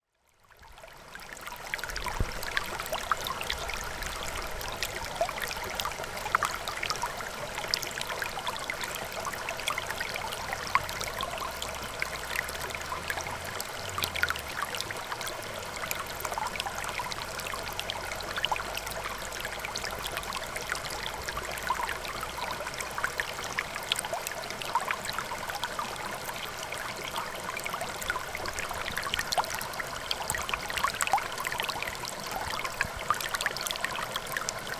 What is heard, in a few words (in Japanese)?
kawaoto kawamo; Chino Cultural Complex